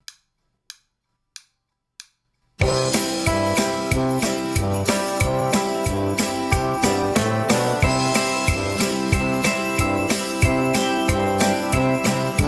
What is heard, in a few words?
Jingle Bells minus